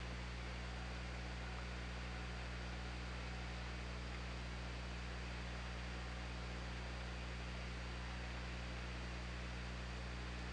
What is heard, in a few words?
OrcaLab